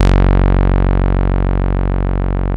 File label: RAW SAW, BASSES; Masterbits - Vintage Voltage; VV BASSES